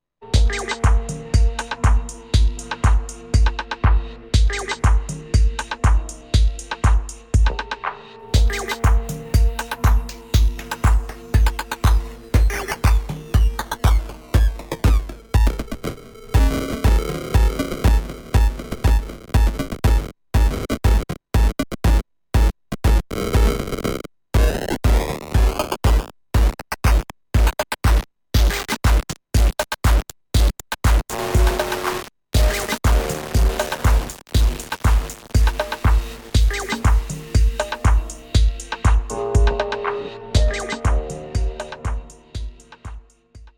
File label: Bit Crusher; Mixxx V2